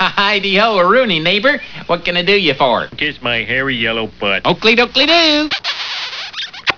kiss butt

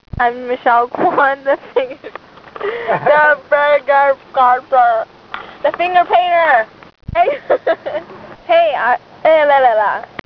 laugh

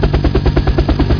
cop loop

